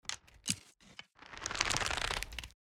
pulling arrow

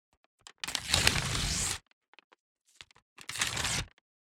paper tear off

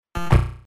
UI invalid sound

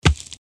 landed on the ground

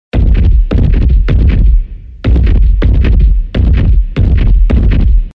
Robotic footsteps

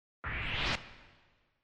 UI comform sound

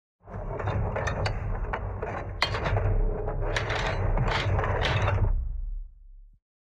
Metal sliding door

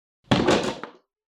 wood material

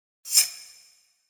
UI back sound

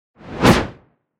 Whoosh

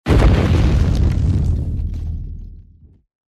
Explosion

